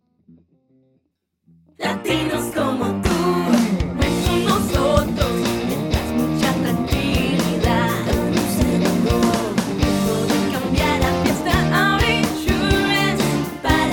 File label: with guitars